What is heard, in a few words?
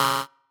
marimba bike; ゆめ2っき